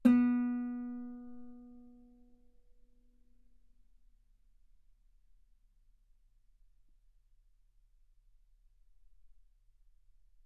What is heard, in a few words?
Guitar ff B3; sul B